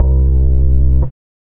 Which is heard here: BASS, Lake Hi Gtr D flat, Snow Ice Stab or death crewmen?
BASS